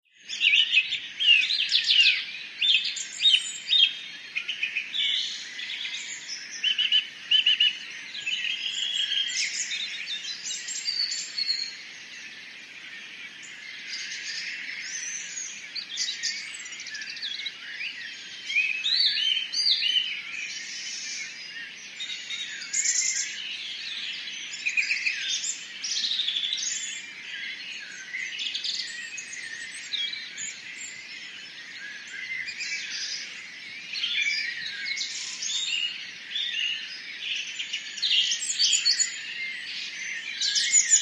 ANIMALS-BIRD BGS RURAL ENGLAND: Dawn, many different bird calls, Kent; Sutton Valence